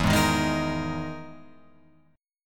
E Major 7th Flat 5th E, Guitar, Open F; strummed; JGuitar

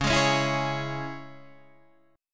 C sharp Minor C sharp Guitar Drop D, chord

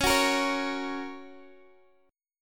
F sharp Major C sharp

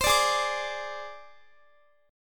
G sharp Major Flat 5th, Guitar; G#Mb5 Chord

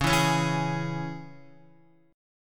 Db Minor, Guitar; Dbm strummed; JGuitar

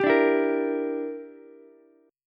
E Minor 7th Flat 5th E, Ukulele; Em7b5 strummed; 12,14,12,13

